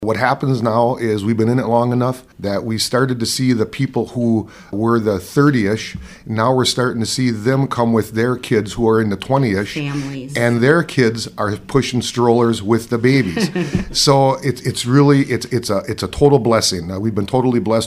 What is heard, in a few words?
Drum